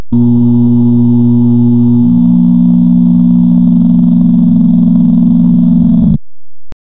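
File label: failure buzzer low pitched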